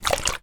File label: food drink dregs of soda drink in bottle tip to side